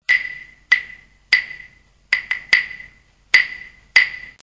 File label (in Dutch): Hout claves